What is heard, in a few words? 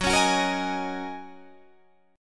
F sharp 6th Add 9th Guitar Open D, chord